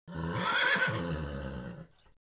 Horse Vox; Ambient; Civ4 beyond sword

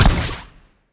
rocket fire

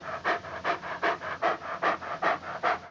dog idle look

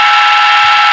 gatling spin loop; Modern Warfare; minigun

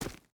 footstep hide gravel run; Rust

